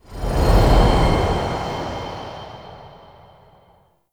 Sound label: Skybox Transition Whoosh; Hub; Tau; Tennocon Relay